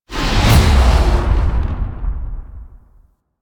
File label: Lift Transfer Cast; Warframe; Focus; Naramon